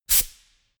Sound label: pneumatic pump quick burst